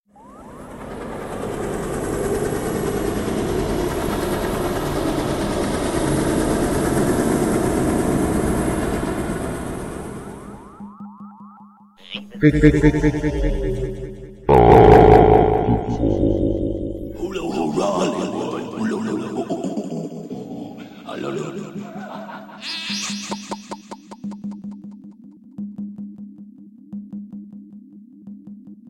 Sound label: Cool Tone